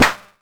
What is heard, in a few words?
Electron Sidstation, Drum Machines